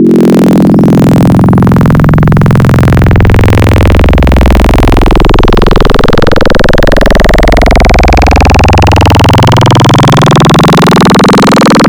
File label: Fm Index II, Wavetables; Cymatics; Wavetables Collection Vol 3; Serum